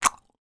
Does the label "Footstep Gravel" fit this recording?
No